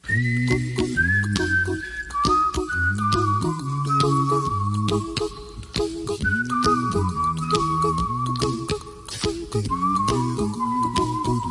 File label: Hindi Gane Ringtone